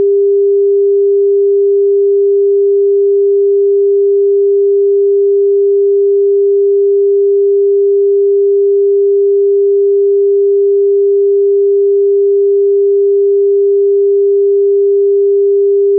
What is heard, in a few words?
400 Hz Tone Sound Sine Wave